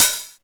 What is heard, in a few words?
natural open hi hat sound a sharp key, open hi hat sample tuned to the A# note